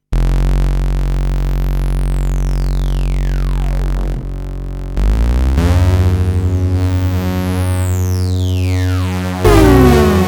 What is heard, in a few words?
Andromeda Poly Portamento